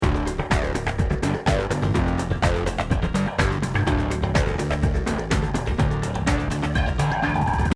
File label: BGM Fire Wheel Win; Hot Spin; CQ9